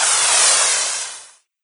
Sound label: fireball throw; doom3